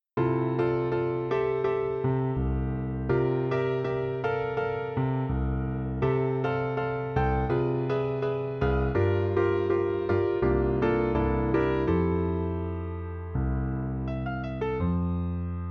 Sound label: Piano